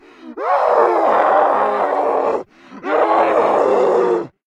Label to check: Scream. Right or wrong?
Right